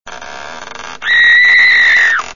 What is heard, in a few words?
Door Creak and Scream